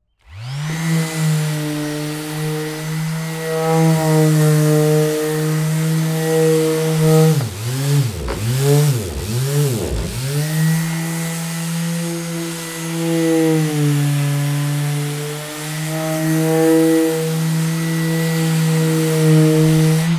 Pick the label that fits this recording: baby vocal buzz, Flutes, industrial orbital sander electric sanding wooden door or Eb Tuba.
industrial orbital sander electric sanding wooden door